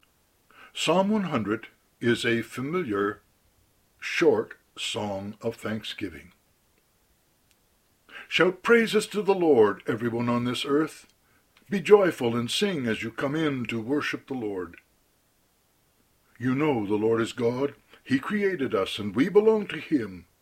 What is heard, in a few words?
psalm 100